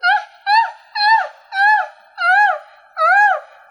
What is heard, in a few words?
"oh oh oh oh oh oh oh" male ecstacy; ooh ahh